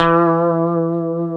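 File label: E 3C, compressed Strat, guitars; Spectrasonics; Hans Zimmer Guitars VOL-1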